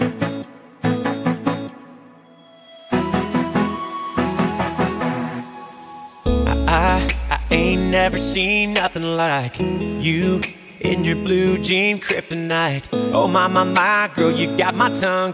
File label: Stutter